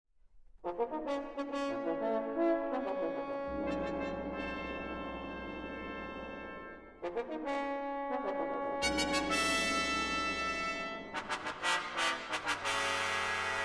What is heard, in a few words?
Brass Fanfares Liturgiques; Brass of the Royal Concertgebouw Orchest